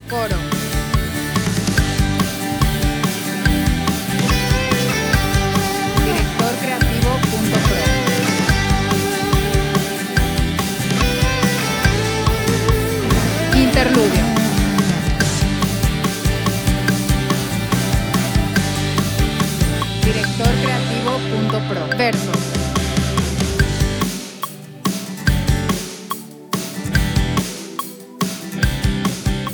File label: 143bpm G